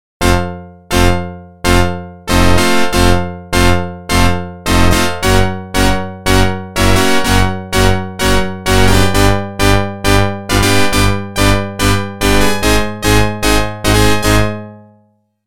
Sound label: Organ; NHL 94